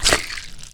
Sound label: squish